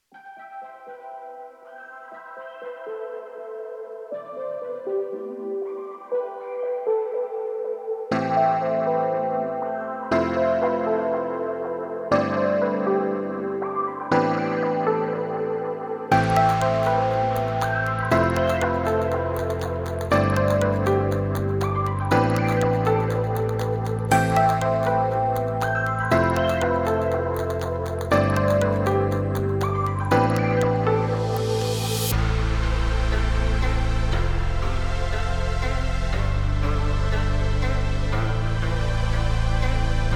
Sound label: retro chill